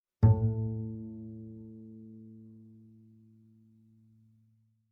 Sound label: Bass pizz ff sul G A2, Double Bass; MIS Pitches - 2014; Strings